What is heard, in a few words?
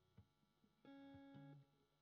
GTR